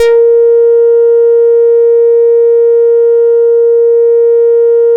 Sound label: A#5, Bass; E-MU Producer Series Vol. 6 – Analogia Project; Boom da Bass